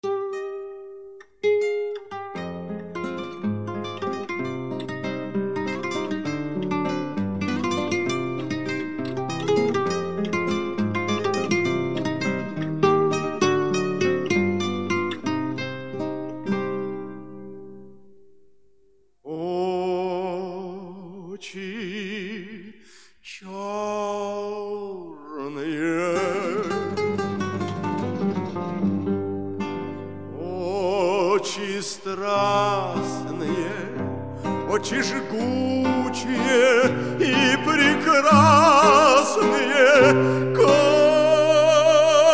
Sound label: music